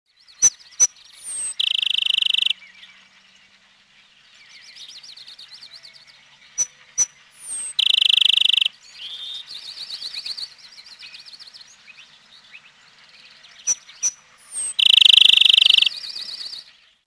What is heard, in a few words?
rufous winged sparrow